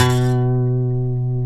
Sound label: C#, funk guitars; Spectrasonics; Hans Zimmer Guitars VOL-1; TAKA FUNK